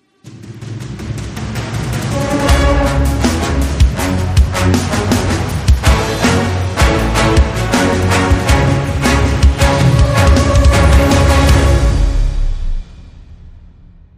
Hybrid Sting, Short

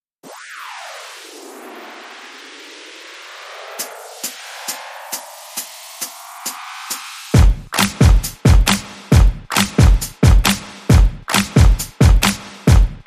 Transmitter Beat

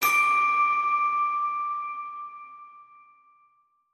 Christmas Ping Hi

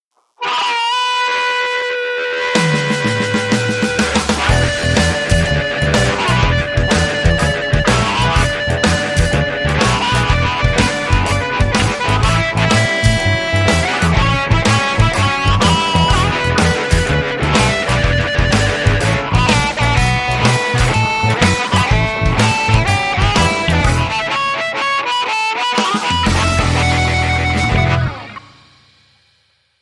Gritty Club Blues

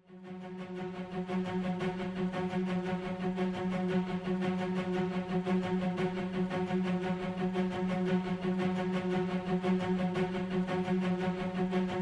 Drums and Short Strings Only

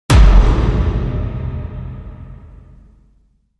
Hit

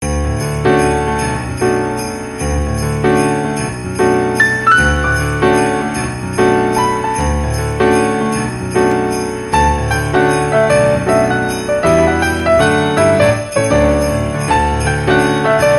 Deck The Halls

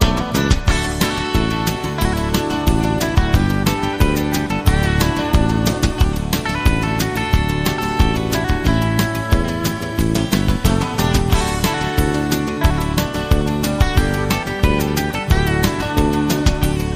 Flatbed Shuffle

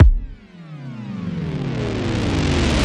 One Shot Psycho Rise

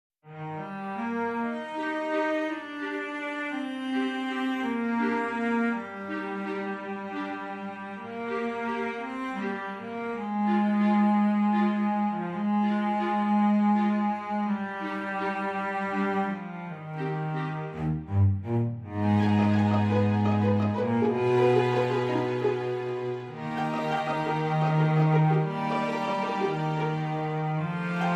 Orchestral Background

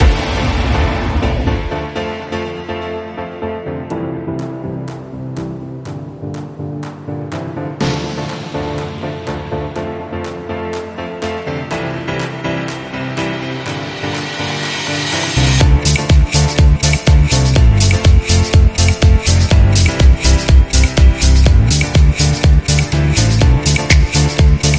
Deep Piano, Inst

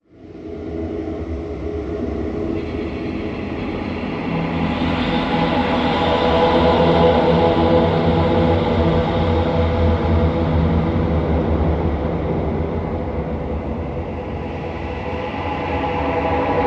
Vocals Eerie Choir Pad

